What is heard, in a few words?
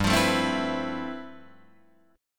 G 13th G Guitar Drop D, chord